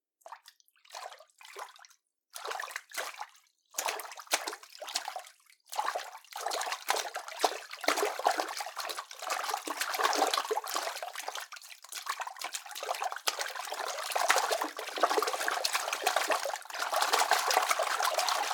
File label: Frantic Splashing